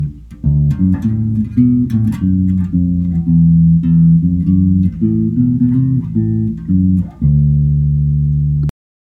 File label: FULL BASS AND MID